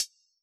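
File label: analog hi-hat closed; Yamaha DJX; AnlgKit1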